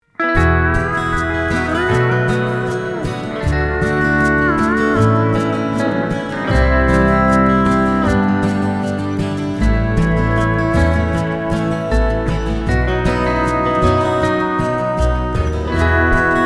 Blues Music Pedal Steel

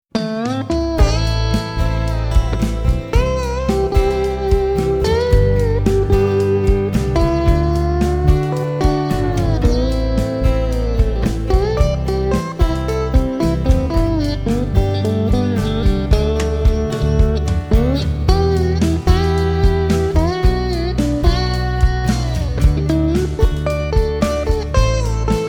Water Blues, Country avenue

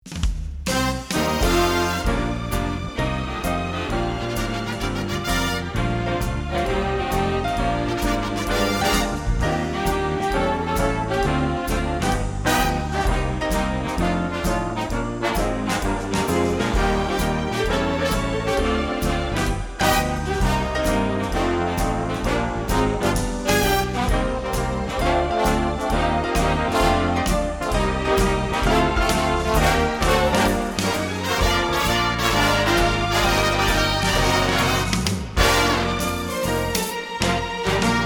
Swing Band

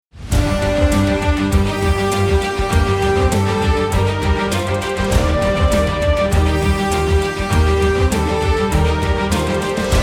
News softer melody; CBS

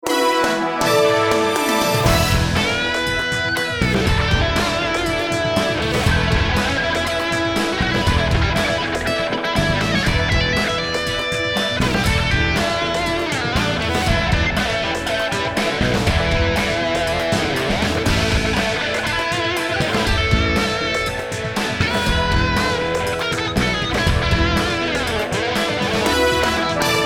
Rock GTR

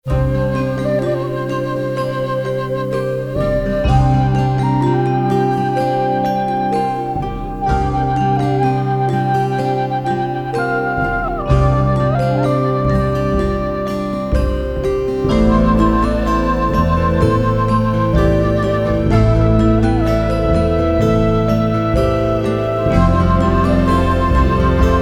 MUSIC THEME Mix Full with Hi Flute

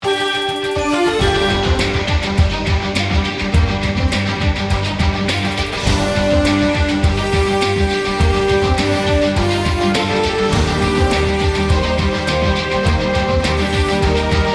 News Demo, music theme demo; CBS

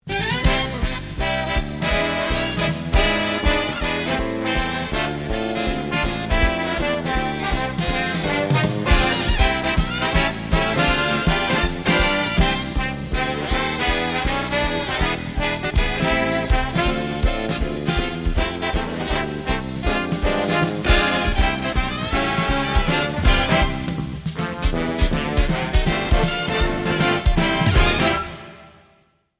Big Band

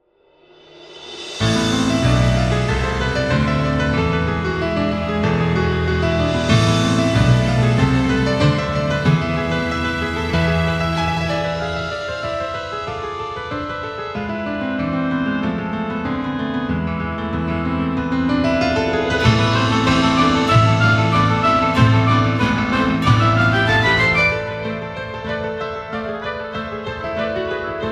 Fast Piece; Marshall

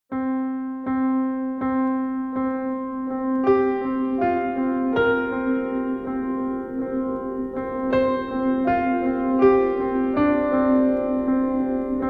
less echo piano; Auschwitz

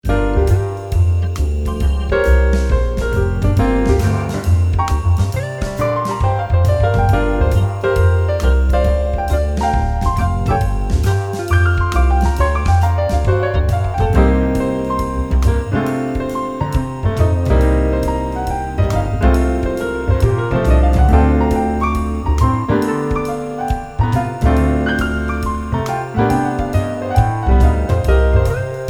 Jazz

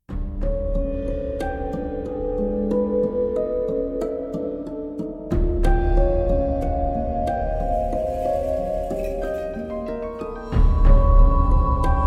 Suspense

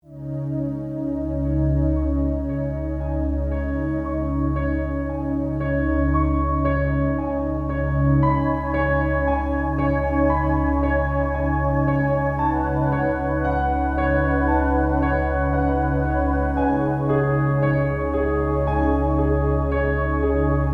Storm No Strings

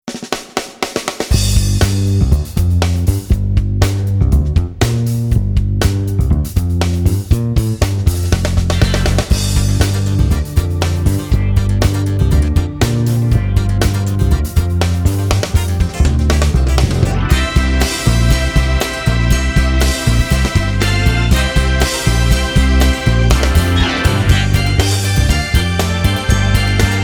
I love 70s

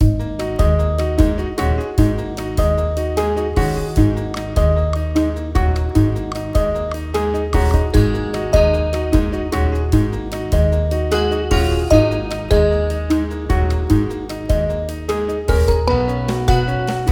JAZZ; We Hold These Truths

